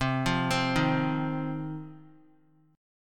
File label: C Minor Double Flat 5th, C, Guitar; arpeggiated; 4F,4A,5C,5G,5C,6E; x,3,5,5,3,x; JGuitar